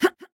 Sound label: basic attack; Akali